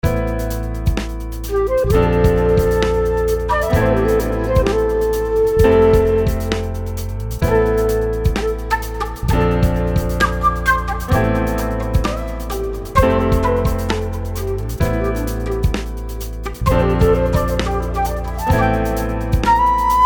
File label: Evocative Flutes